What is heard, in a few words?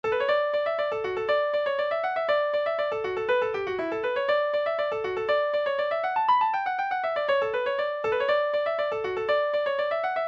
hornpipe; telephone